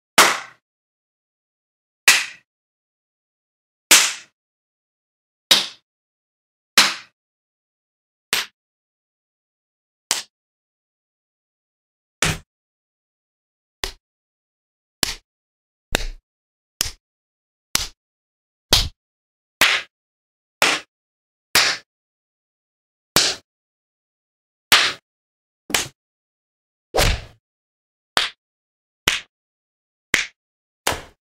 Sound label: Slap Smack Spanking